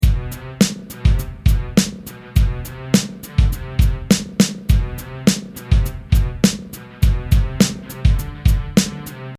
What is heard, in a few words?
hiphop beat